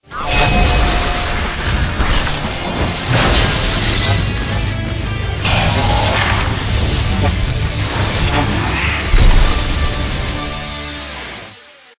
Battle Music; Zak Storm Super Pirate; mobile; Battle Aeria Music

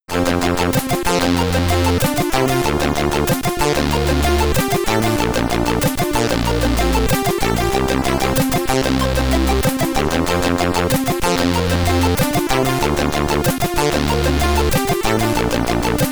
Hall of Fame, SID Stereo; puzzle shuffle commodore 64

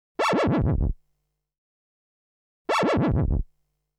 (Sound Effect) Income Tax, String Quartet

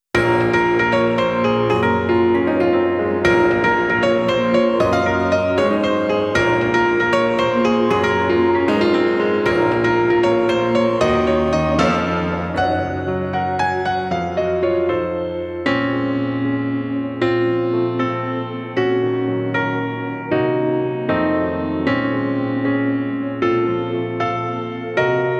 Short Version (without vocals)

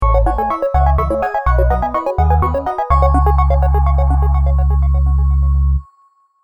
Fanfale; Cave Story

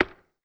BUTTON Short mono; Don't Be Afraid The First Toy

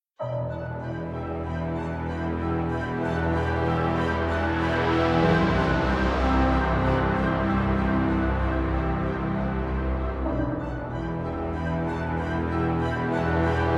Music Sad Ominous No Guitars; starcraft 2 wings of liberty; MissionMusic

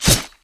Weapon Dagger Critical; Inotia 3

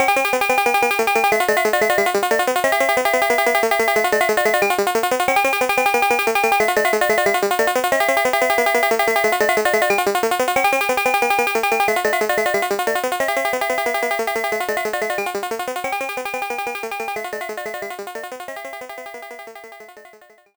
In Game; Pac-Man 99; 10 Left; Dig Dug

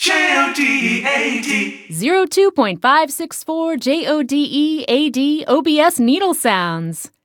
Radio Jingle; let it die; OBS

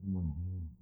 Muffle

